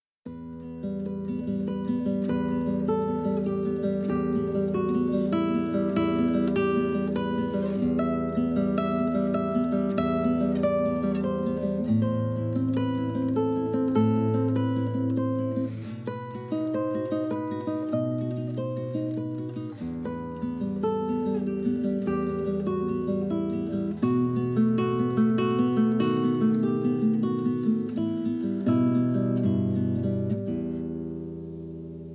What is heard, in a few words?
Spanish Romance